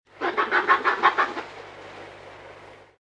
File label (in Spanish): monos